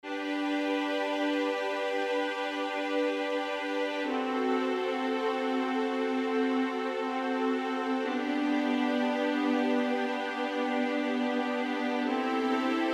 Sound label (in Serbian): akordi gudaci dur